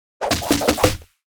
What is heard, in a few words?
basic Attack; tenshi